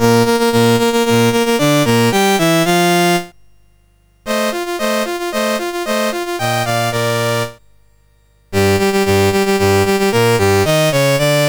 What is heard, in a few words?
Italy, National Anthems, CSG8580R5; Dave Marquis